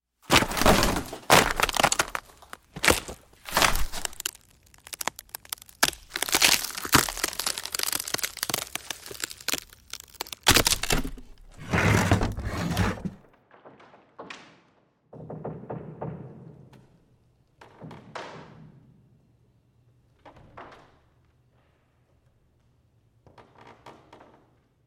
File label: Wood Movement; Big Room Sound